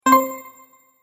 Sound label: Game Sparkle Sounds; Video Game Sound Effects